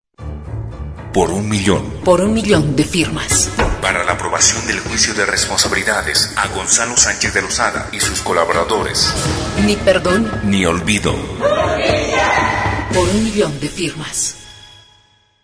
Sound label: jingle